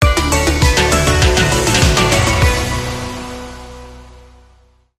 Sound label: Video Calypso Sting